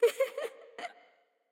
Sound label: giggle; wingsofriches